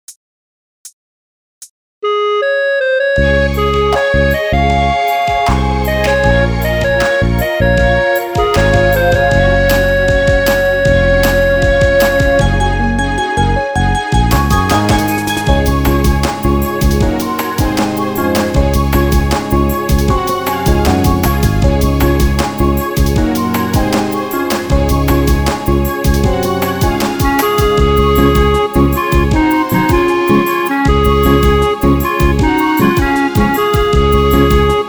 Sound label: denpa ballad melo